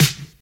trebly snare drum d sharp key, Royality free steel snare drum tuned to the D# note; snare drum samples